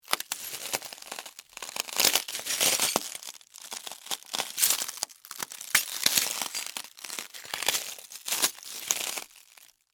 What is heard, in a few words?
Impact Glass Step, Footsteps, Broken, Shards; Glass Sound Effects; Glass (Sound Effects:Materials); BlastWave